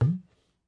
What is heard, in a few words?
Ghe Bend, Tabla; ETHNIC and WORLD PERCUSSION; Tabla Kit Large